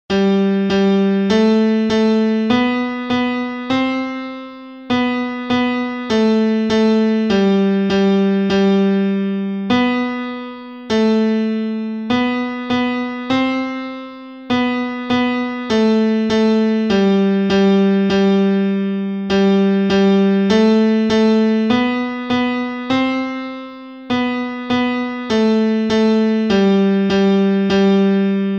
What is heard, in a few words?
piano